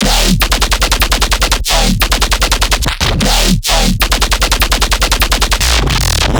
Bass Shot D# 150, Dubstep Basses; Loops; Pyro; GhostHack; Advent Calendar 2021

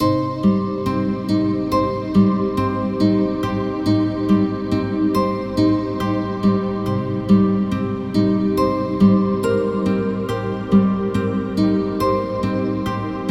Acc Guitar - Cm 70Bpm; Construction Kits; STEMS; Ghosthack; SkyRealm; Kit 19 - Ode To Daenerys

